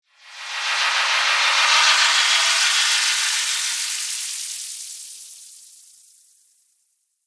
Ascend Whoosh, Scifi, Production Element, Imaging Element, Accent, Transition; BlastWave